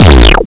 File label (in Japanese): zukyun